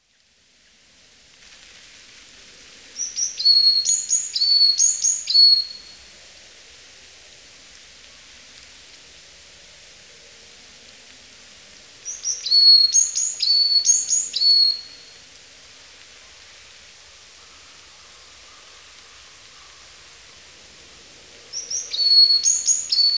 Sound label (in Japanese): yamagara; ヤマガラ; さえずり